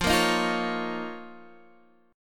F 7th Suspended 4th Sharp 5th F down Guitar DADGAD